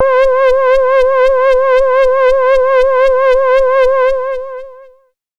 Shakerdown C3, Synth Hits; Zero-G; Soundclash Nu Dance Electro; Acidized

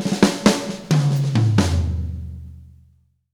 Fills, Monster Beats; Zero-G

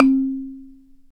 BALA, Balaphone

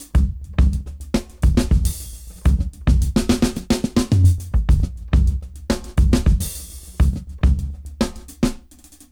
Jazz Dry 104 BPM; AMG - Keith LeBlanc Phat Butt Jams

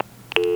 DIAL TONE; OFFICE; AKAI S-Series CD-ROM Sound Library VOL-6

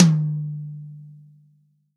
TOM, Real Drum Kit, POP KIT

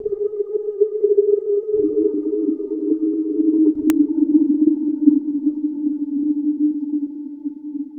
Droon; Sonic Foundry (Sony Creative Software) - Future Retro Dance Excursions

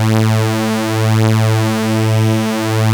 OB A3, Rare Synthesizer; Hollywood Edge - Giorgio Moroder Rare Synthesizer Collection; OB 4 VOX.

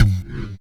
percussion, Severe Gate Kit; Dance Synths

